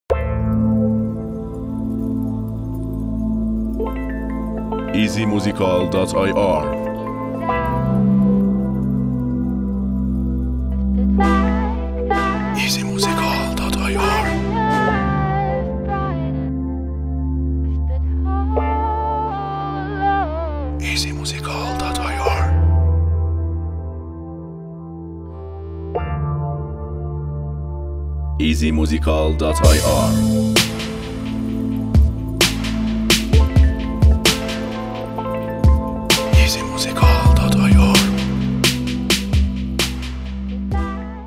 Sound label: Beat